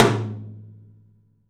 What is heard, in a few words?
open tom; 3D Sound; 3D Drums; 12 TOM